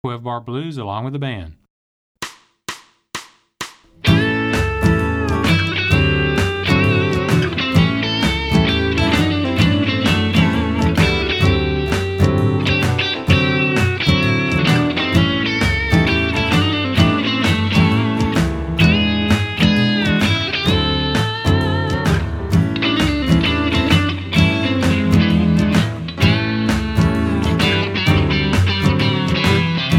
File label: 12 Bar Blues Fast